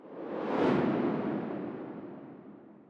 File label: woosh